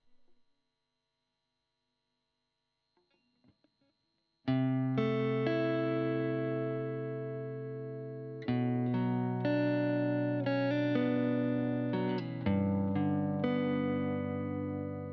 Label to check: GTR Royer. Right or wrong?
Right